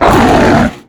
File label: growl; Quake 4 Alpha; strogg tik